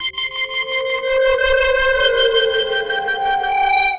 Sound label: Howl Lead, Synth Textures; Sonic Foundry (Sony Creative Software); The Electronic Music Manuscript Collection